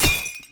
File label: Weapon Bottle Hit